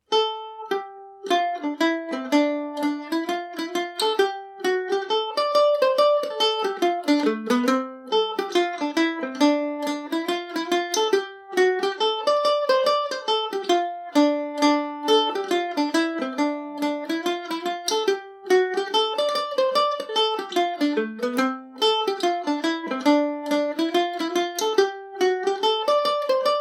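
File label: D dorian, Irish mandolin; peacocks feather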